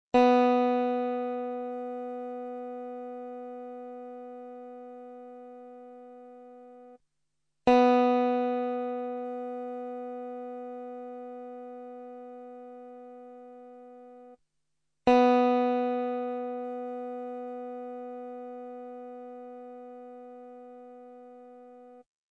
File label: Tuning note B; 10 Rock & Blues Solos; De Haske